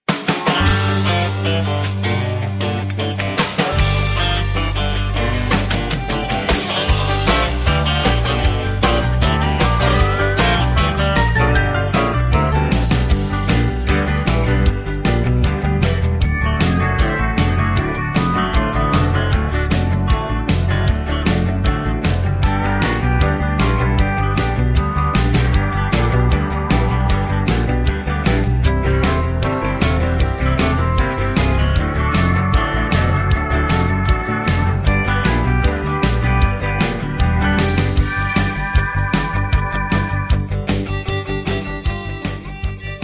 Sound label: (G To Ab) No Backing Vocals